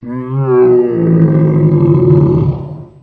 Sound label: scrm